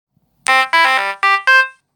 Fanfare

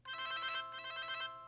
motorola krzr k1 ringtones

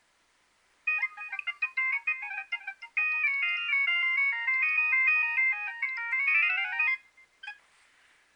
nokia3100 ringtone

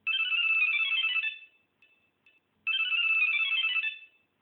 nokia 1100 spiral ringtone

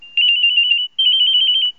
nokia3510i fly ringtone

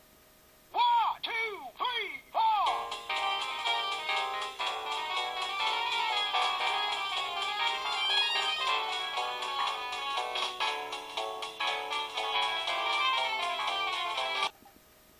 samsung sghe700 ringtone

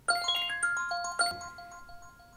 apple iphone 5 crystals ringtone

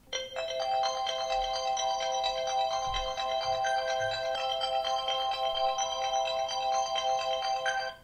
ringtone; constellation; apple iphone 5

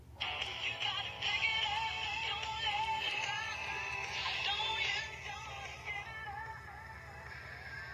nokia 2610 ringtone pick it up

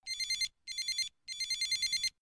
motorola startac ring style ringtone

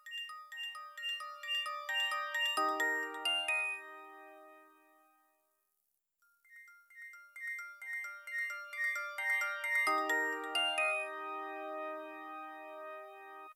nokia 2600 curve ringtone

